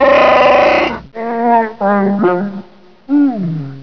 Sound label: wookie